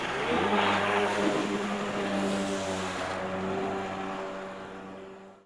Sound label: BOAT